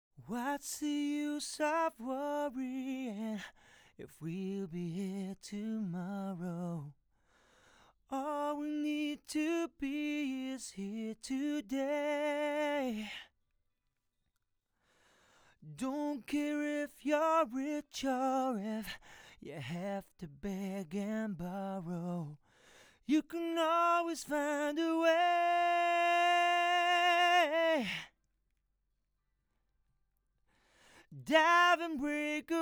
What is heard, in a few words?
Vocal, Male Rock Vocal